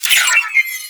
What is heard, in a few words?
Button Timer Failed; Snt Medusa; Warframe; The New War; Sentient Devourer